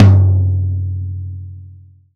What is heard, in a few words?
tom, velocity tom; VELOCITY TOM 2